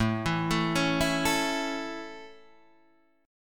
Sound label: C sharp Minor Sharp 5th, guitar chord